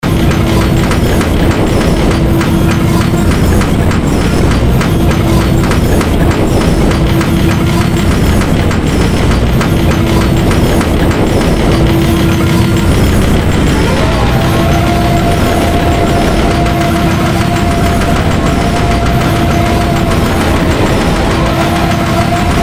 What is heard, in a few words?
Boss Music; cry of fear